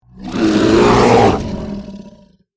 Ferocious bear roar

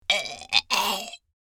Female old woman choking; misc human

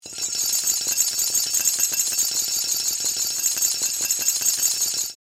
Metallic chain pulling rattling; metallic sound effects

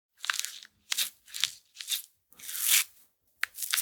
Lotion tube skincare rub on face

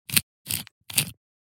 Pepper mill grind grinder crunch; cooking

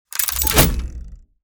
Heavy mechanical movement

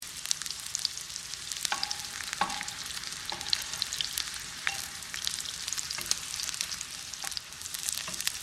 French fries frying sizzle loop; cooking

